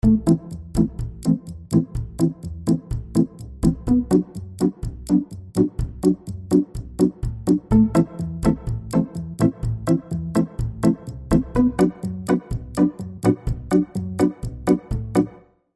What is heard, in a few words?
loop round